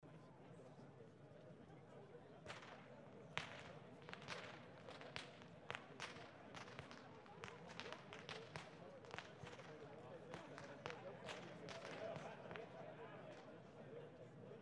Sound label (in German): schnalzen, jugend; waging